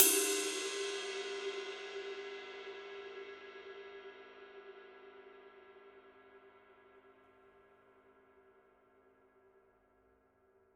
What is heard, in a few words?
paiste formula 602 modern essentials 22 ride body